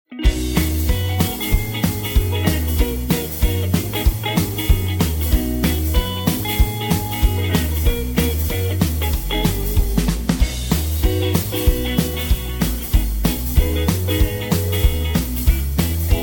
B No Backing Vocals

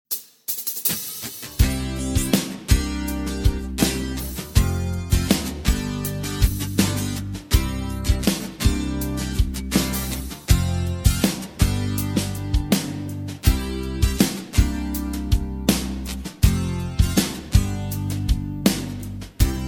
(C) No Backing Vocals

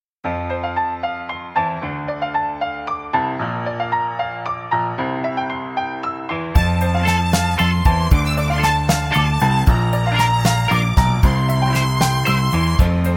F No Backing Vocals

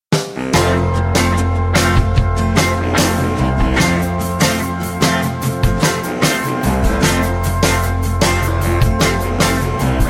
(F) No Backing Vocals

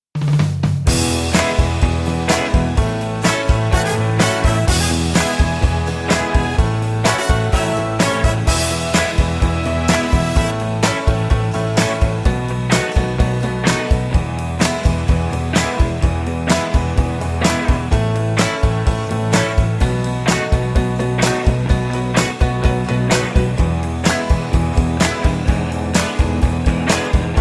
(E) No Backing Vocals

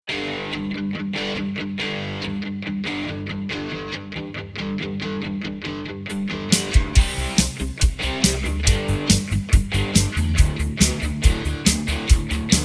G No Backing Vocals

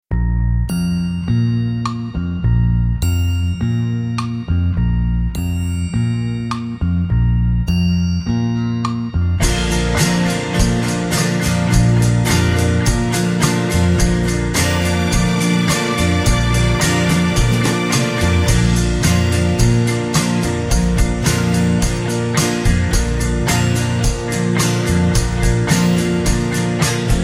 No Backing Vocals

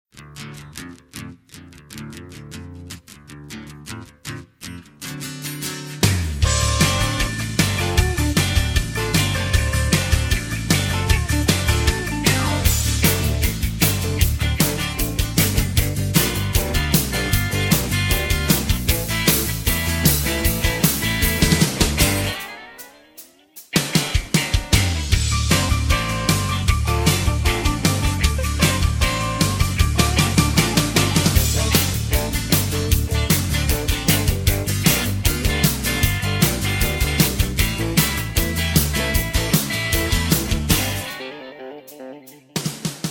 (D) No Backing Vocals

